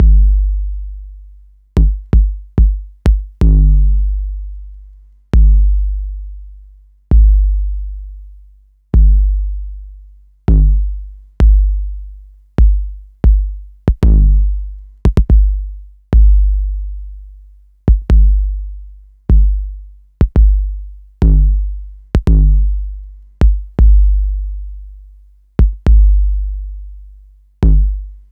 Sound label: 808 tape kick